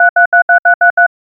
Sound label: beep cellphone short cybe